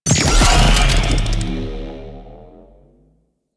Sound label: Crewship Pilot Enter; Warframe; Vehicles; RailJack; Crew Ships; Corpus Crew Ship; Engines